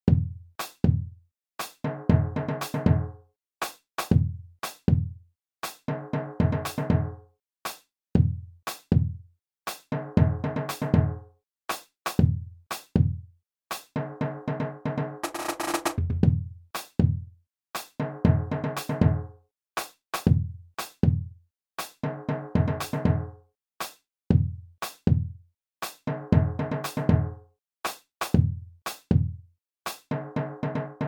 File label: stadium groove, brass